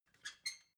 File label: ladder metal handling short